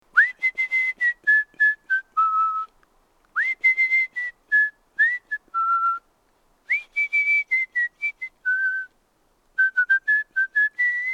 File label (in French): HOMME QUI SIFFLE